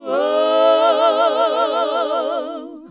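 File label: Gospel; Best Service Gigapack 1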